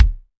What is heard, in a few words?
SR16 Kik Dry Wood, Bass Drum - Kicks